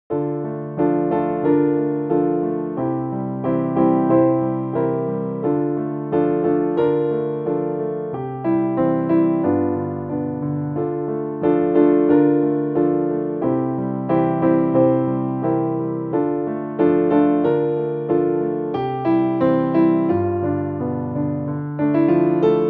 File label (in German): Playalong Klavierbegleitung